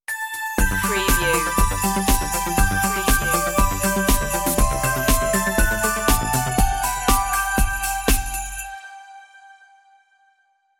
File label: merry christmas synthified stinger intro